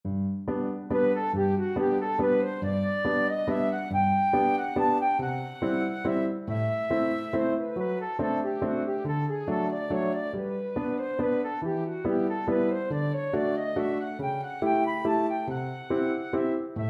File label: Berlioz Symphonie Fantastique flute